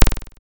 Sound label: stick; frozen-bubble